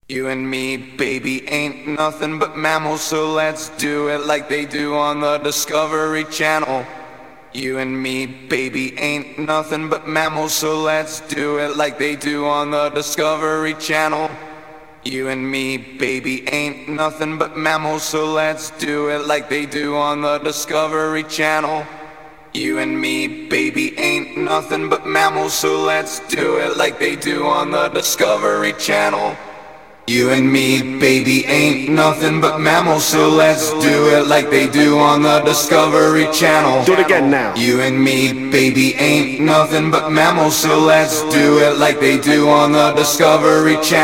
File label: acapella